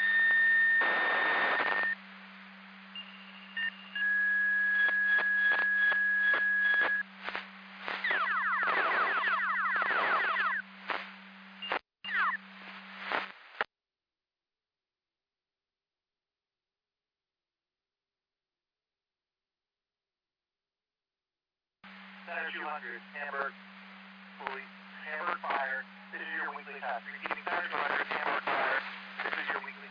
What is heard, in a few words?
Siren; Hamburg FD